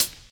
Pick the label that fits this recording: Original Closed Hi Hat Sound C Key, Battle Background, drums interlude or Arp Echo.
Original Closed Hi Hat Sound C Key